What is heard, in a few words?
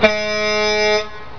horn